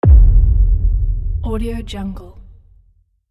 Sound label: cinematic low hit; starmusicproduction